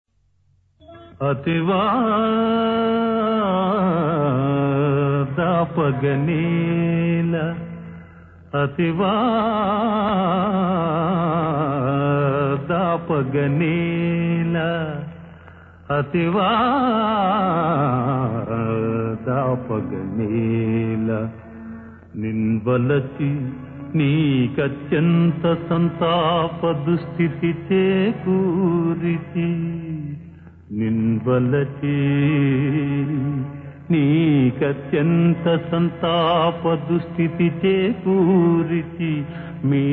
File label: Padyam; NalaDamayanti